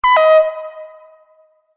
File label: Multimedia Event Notification, beep sound effect